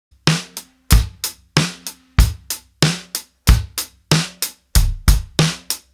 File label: norelco drums